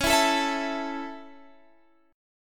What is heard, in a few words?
C sharp Minor Sharp 5th, guitar chord; C#m#5; strummed